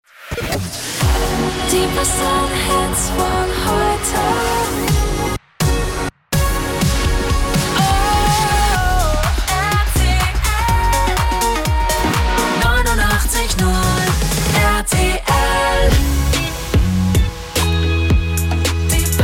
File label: Jingles; 890RTL